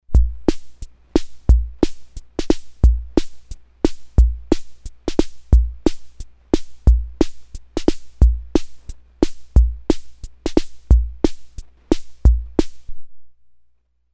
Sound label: FOX TROT, Ace Tone Rhythm Ace FR-2L